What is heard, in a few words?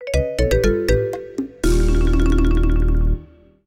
Jingle, Try Again; streetpass mii plaza; flower town